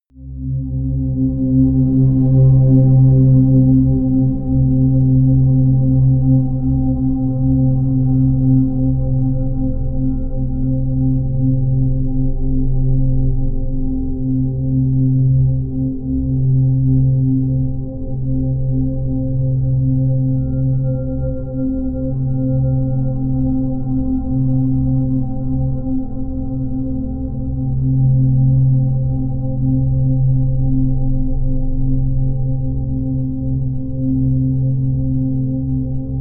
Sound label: drone moon strings